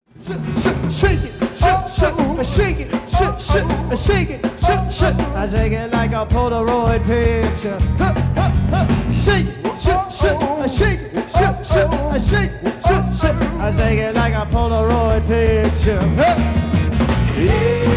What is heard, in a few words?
drums